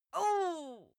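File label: female pain, screams; schreie; menschen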